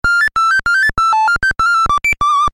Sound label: doot; Carpathian Night demo 2019 Halloween